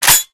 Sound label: spas12 close, weapons